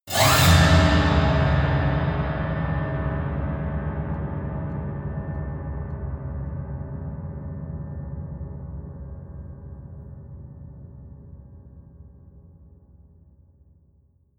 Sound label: piano twang fast squeal long